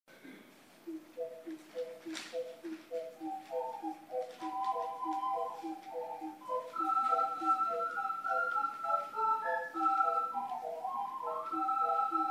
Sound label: ocarina; metro